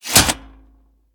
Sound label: Gear Room Foot Pedal; moul-assets